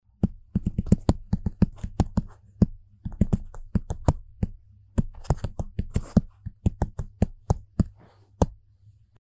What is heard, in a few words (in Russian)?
Звук громкого стука пальцами по экрану смартфона